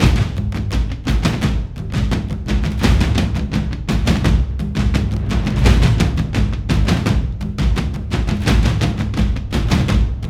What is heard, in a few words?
Drums; For Honor